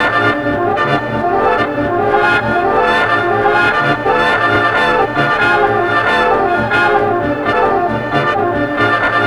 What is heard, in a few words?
swingin, surreal 40s; Spectrasonic Distorted Reality 1